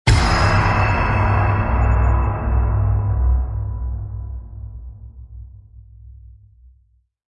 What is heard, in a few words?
Deep Space Hit Metal; Ninja Tracks